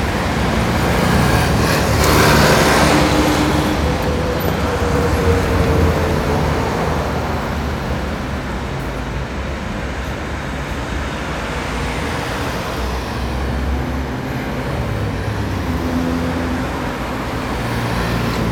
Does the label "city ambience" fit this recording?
Yes